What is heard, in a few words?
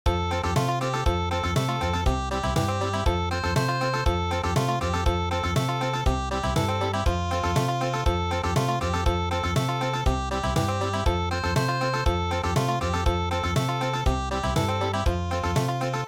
Country